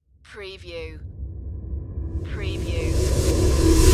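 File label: Production Element Reverse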